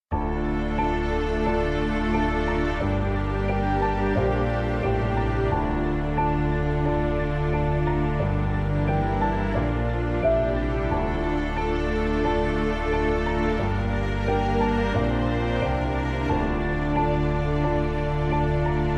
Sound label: Emotional Piano